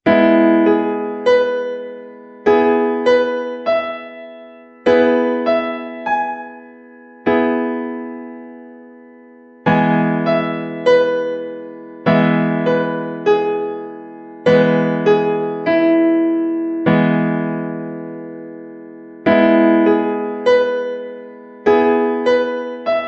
E Piano Keyboard Chord

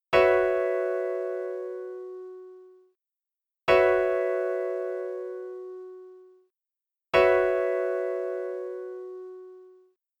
Gb7sus4 Piano chord

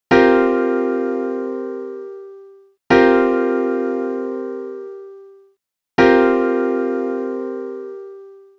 C7sus4 Piano Chord

